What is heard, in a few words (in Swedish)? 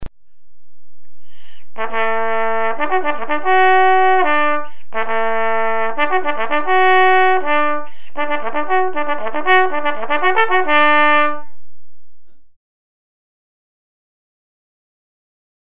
fanfar